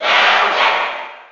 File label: Cheer; Bowser; SSB4